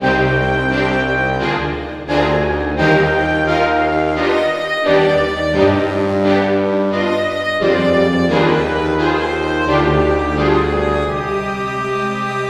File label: game lost; radakan